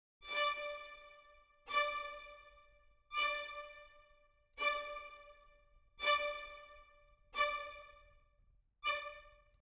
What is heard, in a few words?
Strings; Albion Timemachine